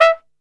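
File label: E3, flugelhorn, brass; Best Service; Brass Super Section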